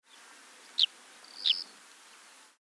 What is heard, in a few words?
Capped wheatear